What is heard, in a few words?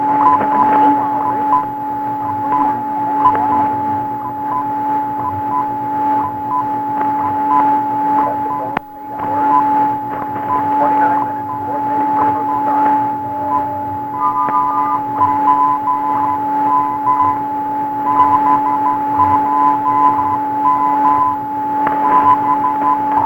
WWV WWVH BPM VNG, 10000; United States China Australia